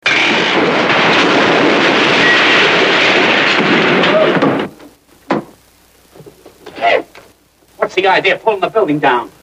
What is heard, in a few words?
building falling; (Curly Makes The Building Fall Down) Moe